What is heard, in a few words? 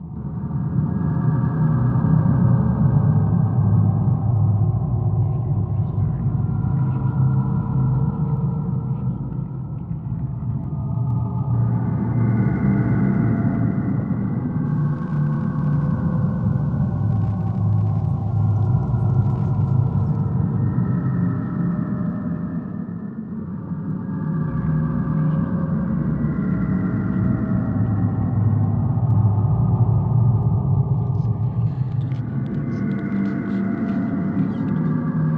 Ambience